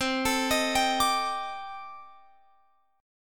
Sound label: C Minor 6th Add 9th, C, down, Guitar, Open F; arpeggiated; Cm6add9